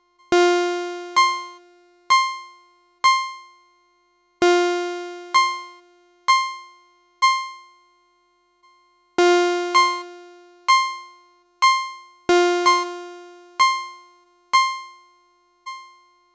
Strymon Magneto shift mode buffer read

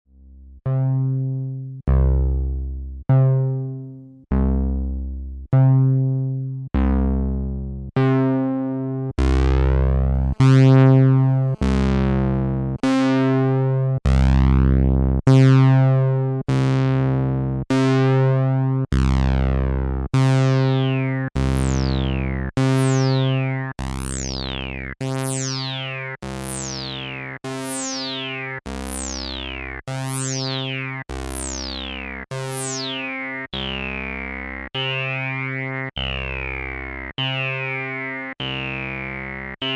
Filtertest left RE-303 right TB-303

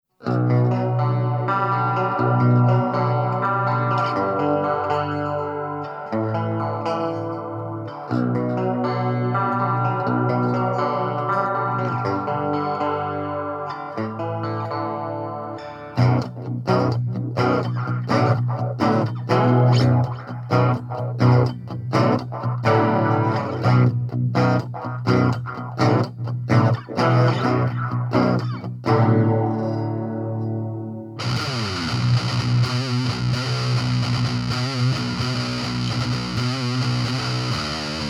E Guitar Antelope Edge Note Pur

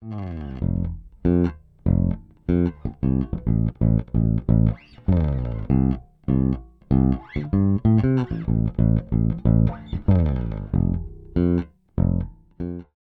DI Solid; Zoom B2 Four

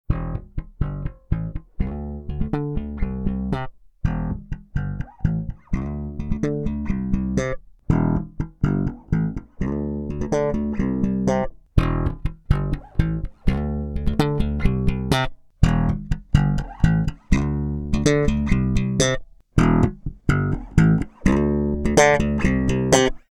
slap

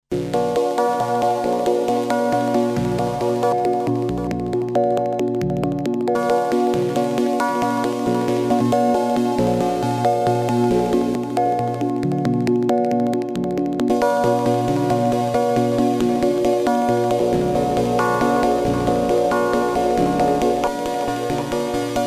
Cobalt8 Selector Arp

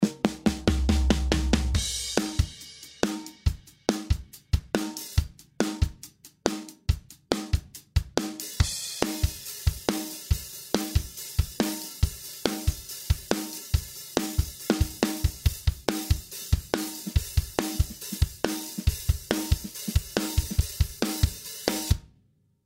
fat channel comp 1176; presonus studio one 3.5

